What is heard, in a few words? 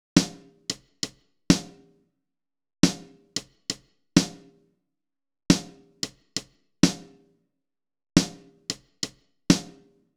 Drums - snares